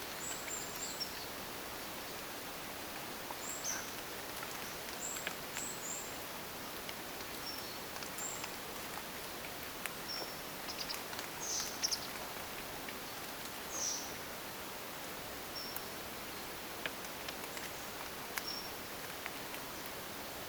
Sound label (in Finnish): tietaakseni tama on taigakirvinen huomaa etta huomioaanten lisaksi siina on yksi lentoaani; taigakirvisen huomioääntelyä sekä yksi lentoäänikin